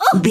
voice female hurt pain high

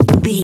footstep wood land

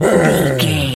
gorilla angry

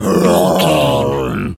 gorilla growl

